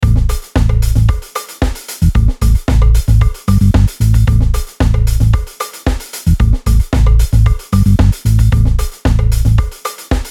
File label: Dreamy groove; Disco grooves